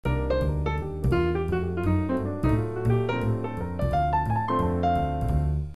piano

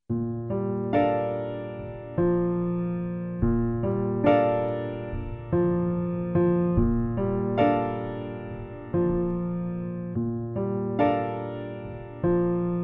Bass Milonga

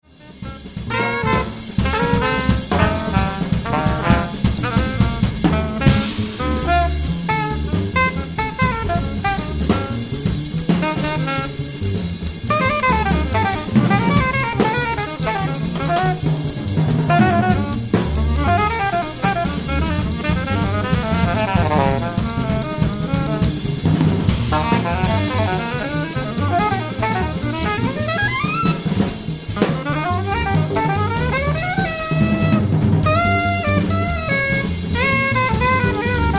wood flute song

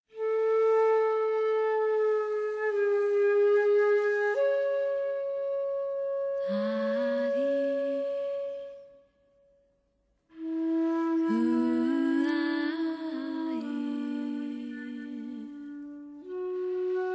Tango Ensemble

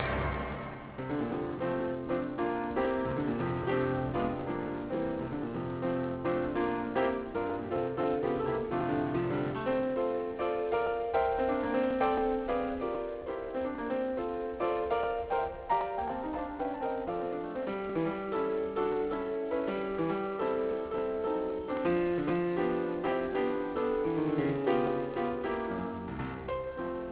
piano

